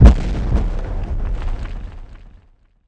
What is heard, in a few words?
Far Explosion; Bomberman; Standard Assets; Detonator